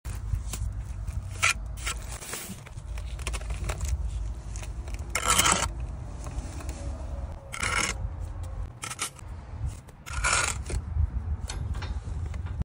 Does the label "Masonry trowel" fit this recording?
Yes